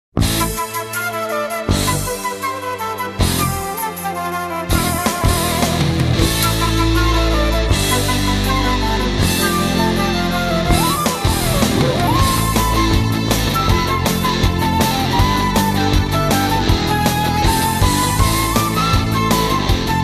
Super Turkish Rock

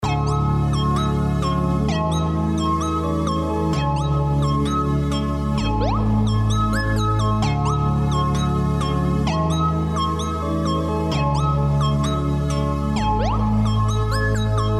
Relaxing gameplay

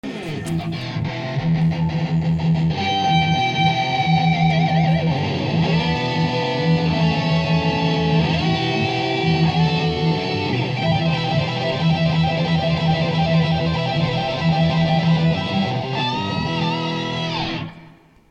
Guitar twin solo; meloboom

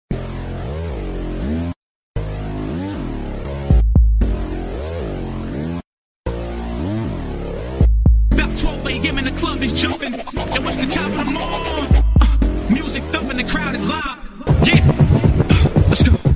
Pista Telugu

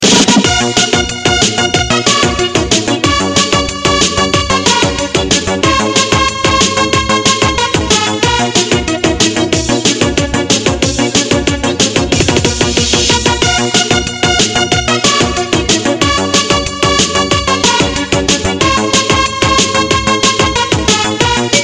disco

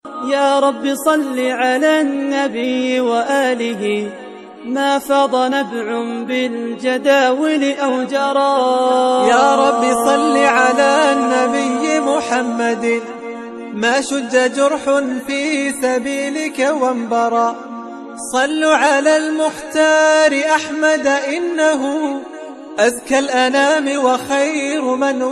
islamic

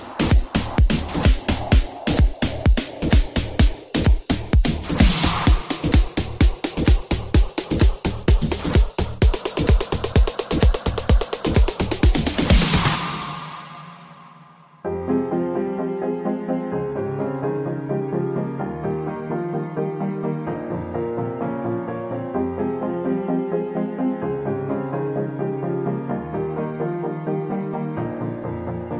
DUBSTEP MIX; FROZEN

